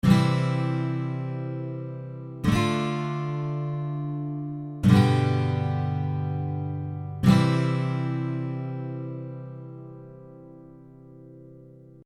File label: Gt C D G C